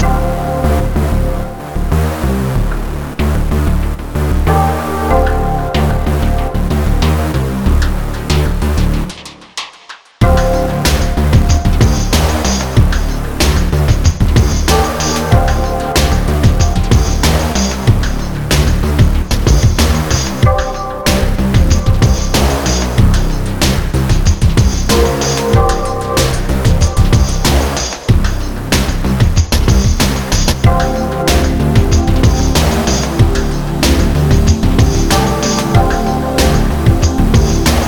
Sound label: hard rain, electronic